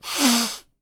blowing; 1000fx

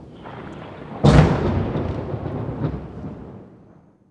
thunder; 1000fx; WEATHER

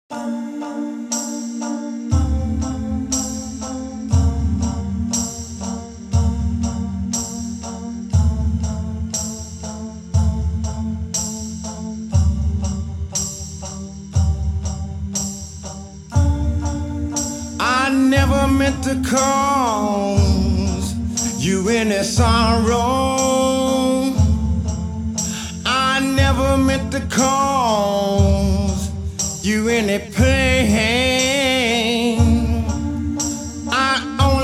A Cappella

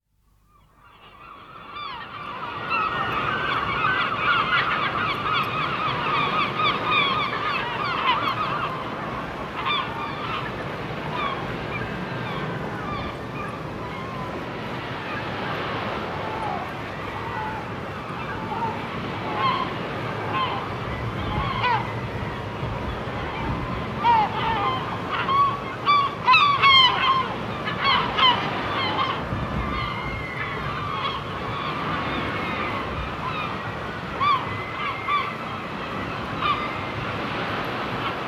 Seagulls On the Shore; Dr. Sound Effects; 210 Nature Sounds - 20 Hours of Relaxing Natural Ambiences for Meditation and Sleep

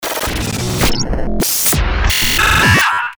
Yell Pellet, Stabs & Bursts; Best Of N2O Library

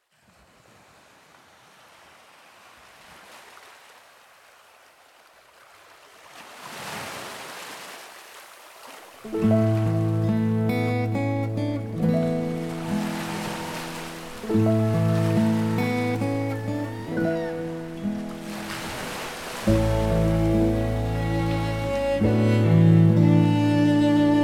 Forest Cello

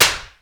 SLAP, FIGHT; 1000fx

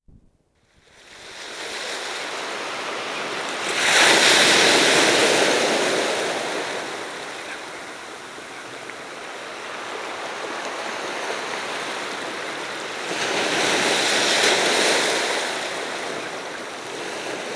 Soft Waves Kissing the Shore; Dr. Sound Effects; 210 Nature Sounds - 20 Hours of Relaxing Natural Ambiences for Meditation and Sleep

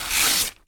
PAPER, TEARING PAPER

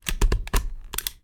STAPLER

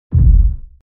Bass impact - Normal bass thump impact; Hollywood Edge Sound Designers Tool Kit

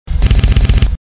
Flabby Bass; Best Of N2O Library; Breakers & Transitioners

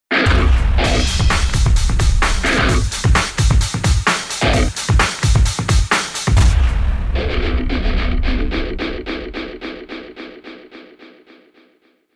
Riff On It Groove; DJ sounds; Mtrax

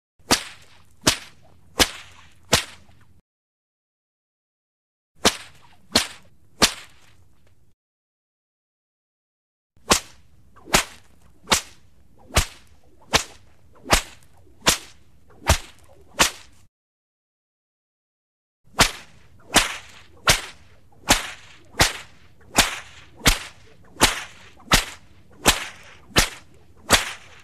Whip Lashes Multiple Fast Multiple Whip Swishes; Hollywood Edge Foley Sound Library

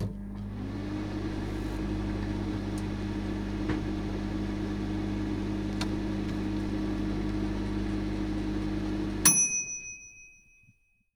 MICROWAVE; COCKING; 1000fx

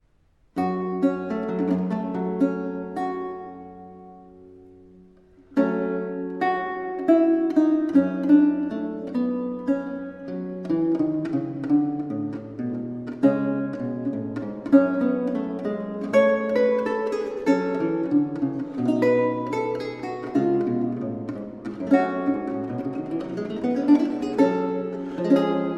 Tiento Over Tochata, Lute; L Romanus

